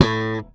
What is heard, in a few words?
Slap One Shot A, Bass; Bass X